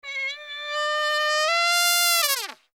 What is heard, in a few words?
long muted trumpet elephant calls